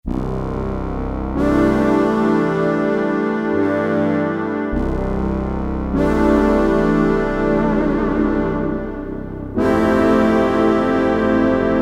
Brass, Korg DSS-1

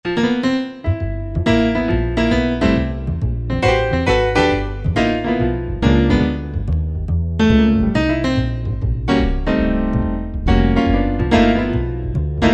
Bass & Piano, Korg 05RW

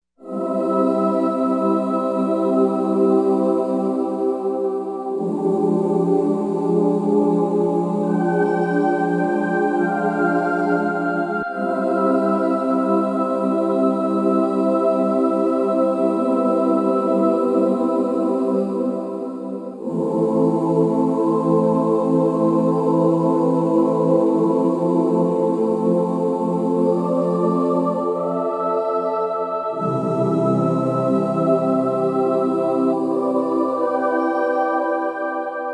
Children

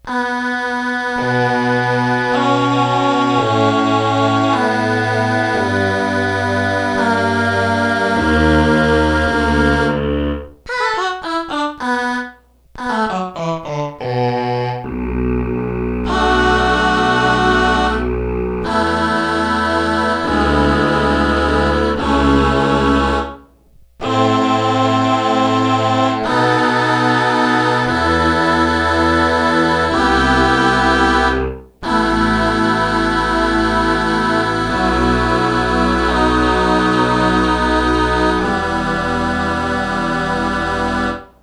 AH VOICES

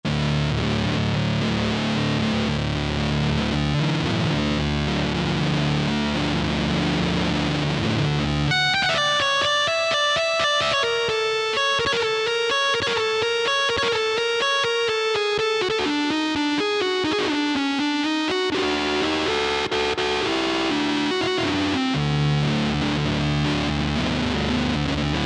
Roland JV-2080 Organ; Assalt Organ

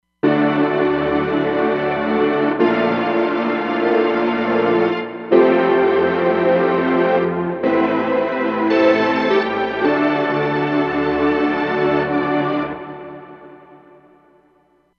Moody Tron, Roland Fantom XR

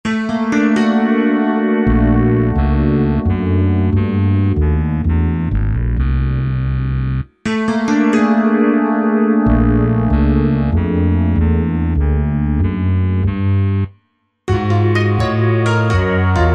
NuJazz Split; Korg TR-Rack

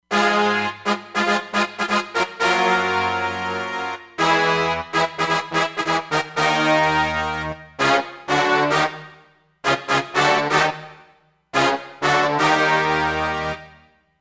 Brass

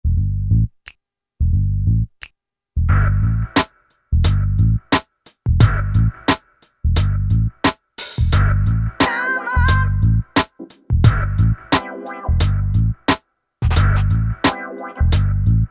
HIPHOP, Yamaha DJX; Factory Demos

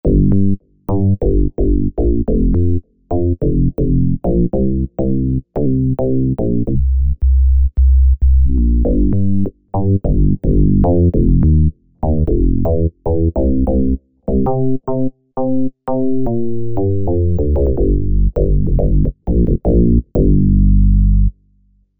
Tight Synth Bass; Apple GarageBand 2

